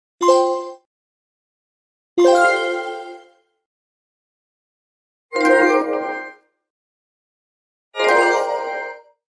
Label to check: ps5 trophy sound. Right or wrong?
Right